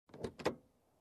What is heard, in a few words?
Opening compartment